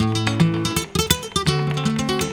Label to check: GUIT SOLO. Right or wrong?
Right